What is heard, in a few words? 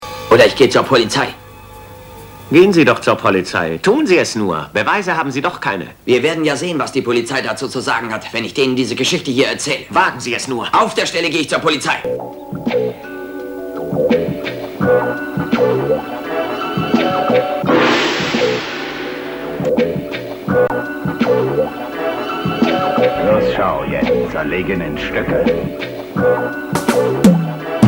police mode; brucelee